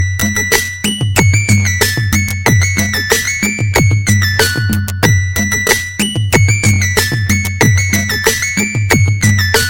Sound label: dubstep ringtone; airtel